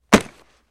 Football Catches

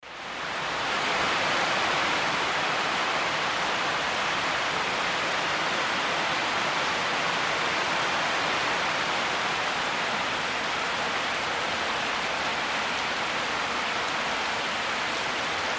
Tropical Deluge, ext

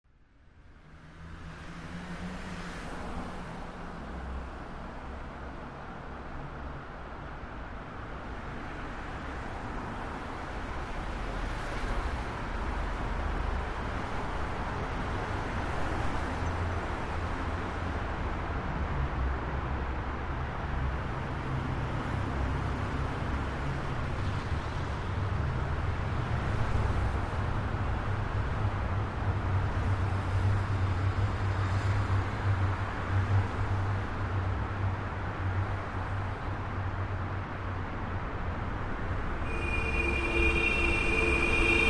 Light Traffic Tunnel

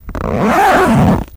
Zipper On Bag; zippers

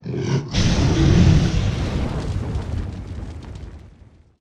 Dragon Roars Breaths

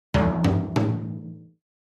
Drums Toms A Accent, drums tom

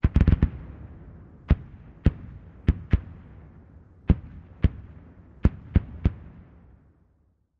60MM MORT EXPLOSIONS, mortars; 60 mm Mortar Explosions 1

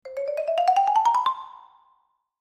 Xylophone Shrt Ascen, mallets xylophone

